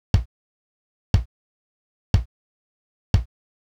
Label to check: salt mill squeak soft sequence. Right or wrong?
Wrong